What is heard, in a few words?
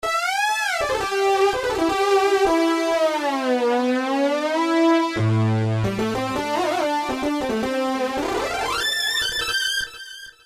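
Guitar, Roland PN-D50-01; Griitttarr 2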